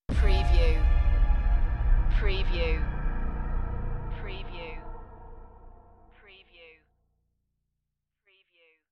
Trailer Production Elements - Hit - Urban, Underground, Alarming